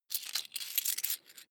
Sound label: coins big clatter